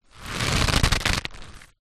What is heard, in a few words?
foley rope stress tighten creak; zapsplat